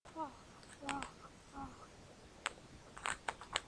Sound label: frog